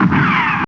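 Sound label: LASER GUN; East West - Global Sounds; STARS FXS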